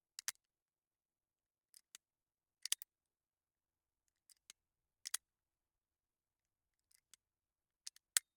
Smith & Wesson 60 - Open Close Revovler with Shells; Pole Position; Smith & Wesson .38 Chiefs Special Model 60; VAR SFX